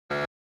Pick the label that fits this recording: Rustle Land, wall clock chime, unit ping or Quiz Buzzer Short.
Quiz Buzzer Short